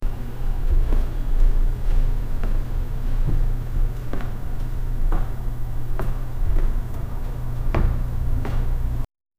empty neighborhood ambien

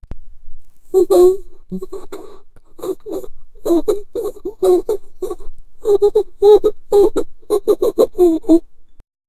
jurassic, tyrannosaurus rex

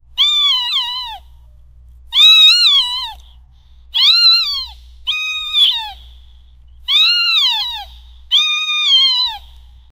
ultra realistic baby sea, mammal

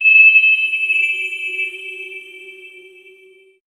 heal regenerate full sound; healing

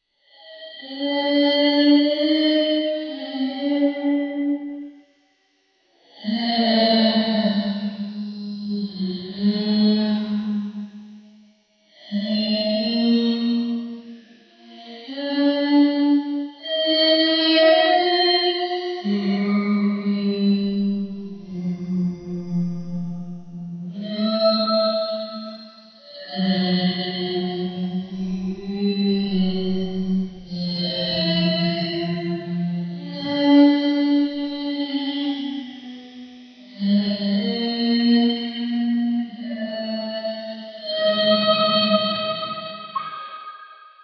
angelic; unity game developer

